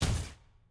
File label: aah; jhin